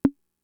Conga, Fricke MFB-522

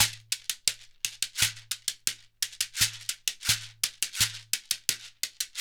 SHEKERE, Synclavier Percussion; World & Orchestral; ILIO

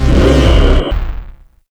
BASS DRILL; USB Soundscan vol.33; AKAI; Disjointed Textures

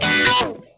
RASP GULP, Funk Guitar; 90 s Sample CDs; Zero G

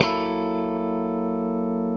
FUNK CHOR, Funk Gtr & Wah; PlatinumPhattCD2; E-MU Producer Series Vol. 8 – Platinum Phatt

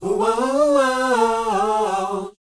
SHOO B D, R&B Dance, vocal; Spectrasonics Vocal Planet

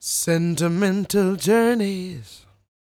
croon, Vocal Planet Blues Soul; Spectrasonics

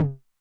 HI TOM, Med Tune Short Decay, 909; classic machines; HT - HI TOM

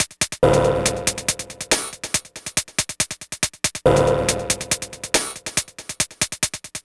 Machine Loop, Drums & Percussion, Electronic; Sonic Foundry (Sony Creative Software) - Electronic Point Blank

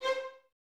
Violins C 5, String Ensembles; Miroslav Vitous; LINKS ORCH

